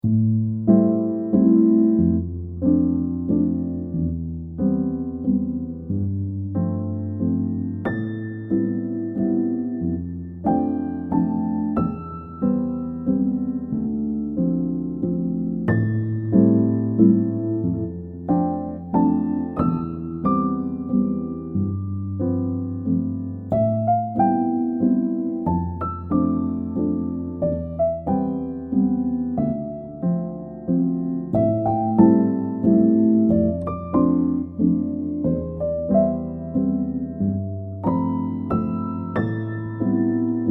valse

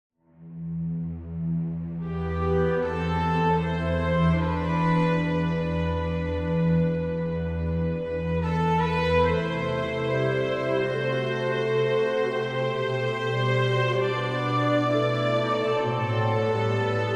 Classique

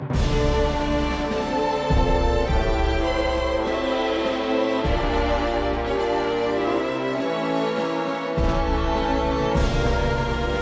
classique; russe tours

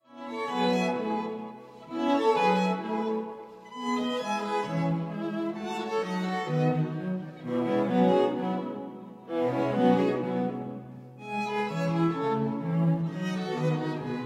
Danse profane en mi mineur; Danses sacrées et profane

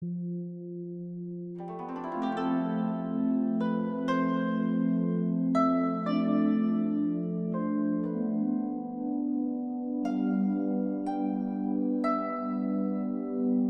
Après kora

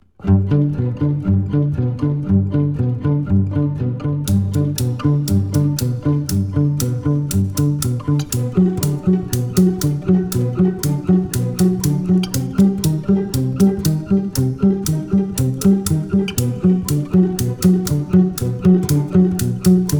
les horloges

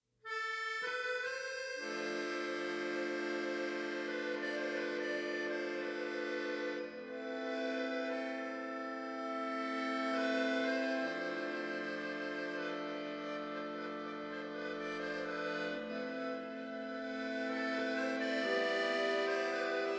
Folk; Mort Jaures

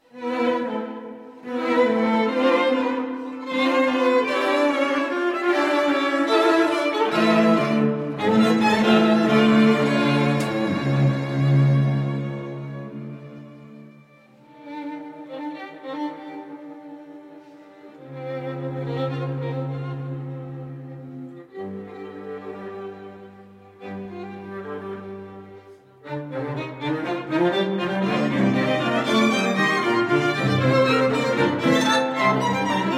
Danse sacree en la mineur

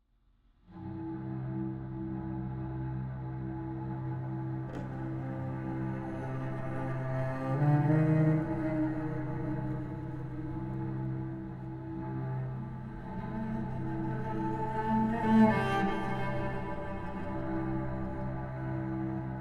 nappes

